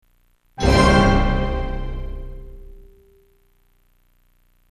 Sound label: arrive; Indiana Jones and the Infernal Machine